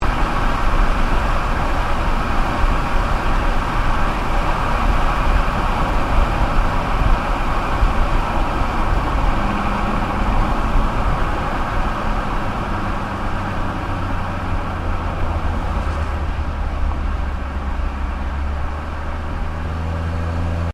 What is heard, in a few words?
Car Ford Focus Decel Exhaust; Ford Focus hire car; Vehicles; Cars